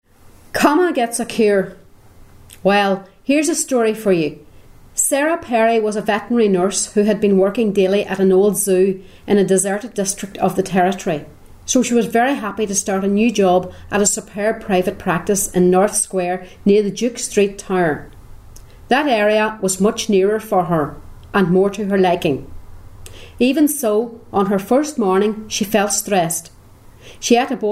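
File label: Raquet Gallop; reading passage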